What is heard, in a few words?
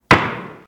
gavel one